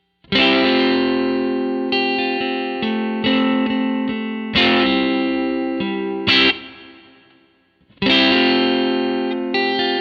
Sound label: Neck, guitars